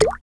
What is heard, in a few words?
Walk Water; FlyForInsanity